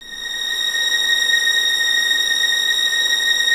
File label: violins A#6, String Ensembles, 23 Violins; Miroslav Vitous